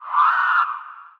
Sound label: Ghost Damage